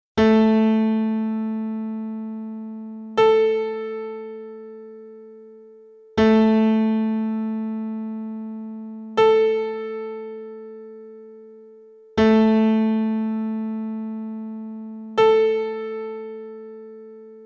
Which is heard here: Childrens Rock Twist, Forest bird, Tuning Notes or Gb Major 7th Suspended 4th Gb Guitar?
Tuning Notes